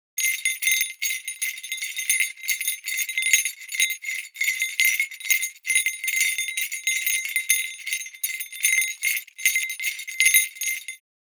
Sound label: Small toy bell light jingling